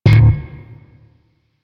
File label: Shawzin Slap Oct One D, Chords; Music; Warframe; Sentient Shawzin